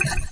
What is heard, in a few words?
BUTTON